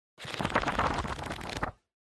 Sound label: Book (Flick Through)